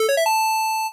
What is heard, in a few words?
MOVE; C64 Dreams; Multi-Sound Default